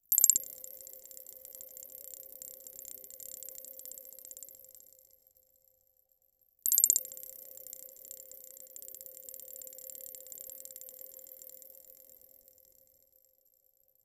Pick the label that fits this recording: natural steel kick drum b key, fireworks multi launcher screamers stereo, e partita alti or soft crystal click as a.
soft crystal click as a